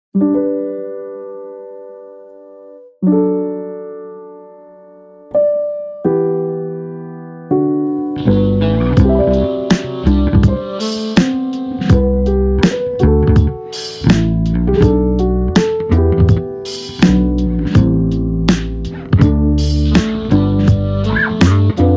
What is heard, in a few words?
shigure inst bpm82